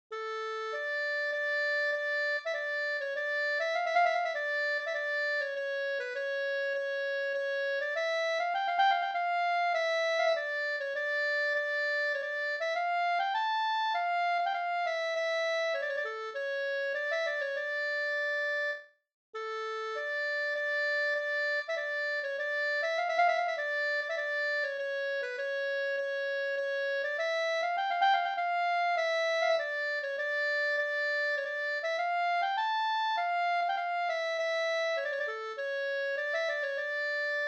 brudmarsch Sorglat; fr Transtrand

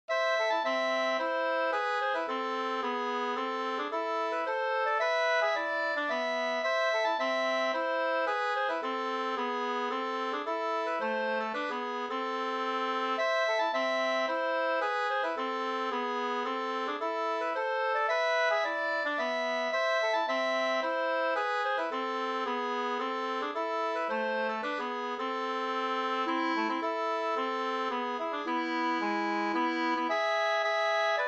polska, 2st; Abraham Bergman Ostavall Mp

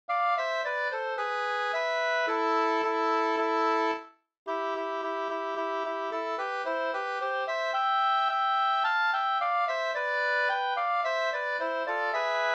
folkdans katrilli; Karjala fi 2st

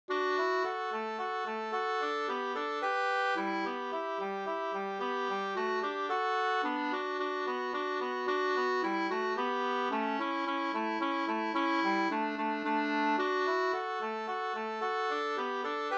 folkdans, 2st; Djupblaa ogon Svsangl9

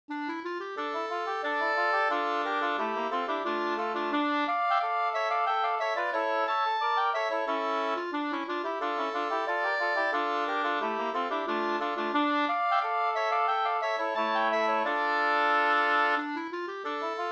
polska Bingsjopolska; e Pekkos Olle nr1 3st

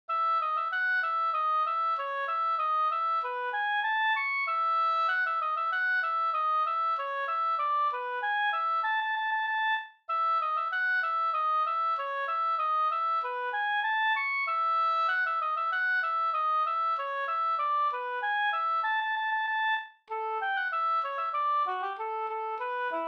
anglas

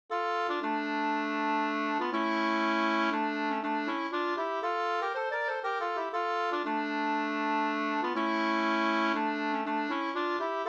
polska kringpolska; Per Jonas Lang Havero

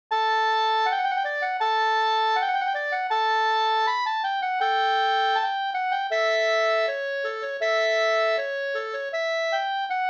brudmarsch; Sarna brudmarsch

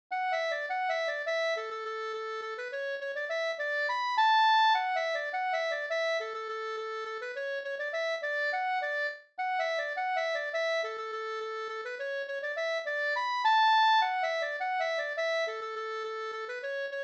polka polkett; Nr1 fr Froson

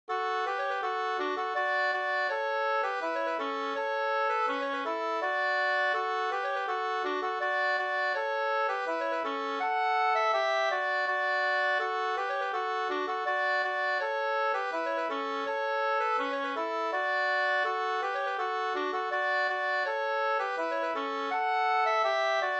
schottis; e Emil Carstedt Slatta046 2st

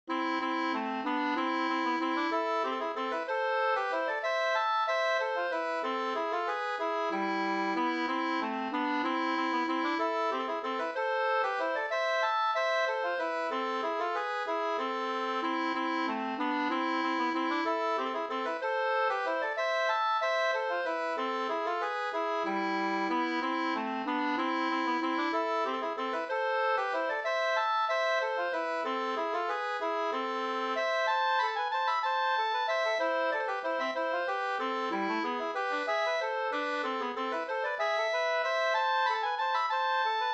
vals A moll; Johannes Johansen

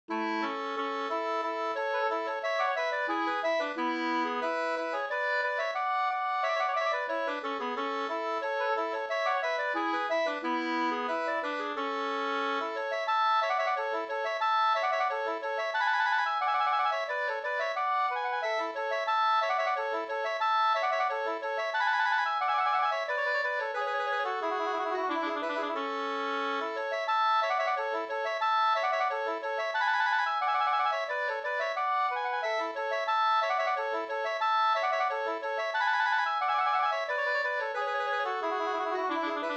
vismelodi Soldatvisa

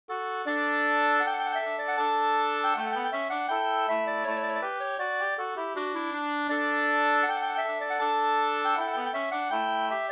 ganglat

